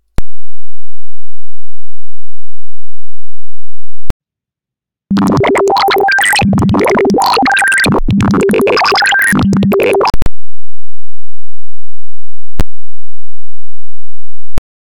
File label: distortion